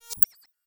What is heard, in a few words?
Select Click, Sci-Fi Interface; Sonic Bat; Sci-Fi Interface Construction Kit Vol. I